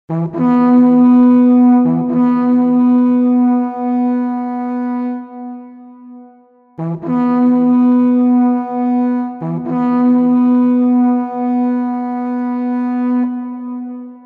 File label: melody; Kingdom Of Fantasy; Melody: War Horn